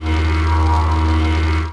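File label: electric beam large loop; electrical sparks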